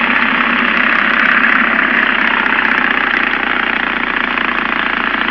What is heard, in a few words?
cruisen, motorcycle sounds